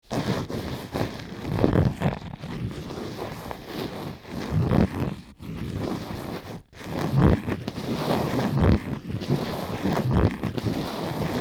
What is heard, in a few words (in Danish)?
Læderpung, lidt voldsommere bevægelser, contact sounds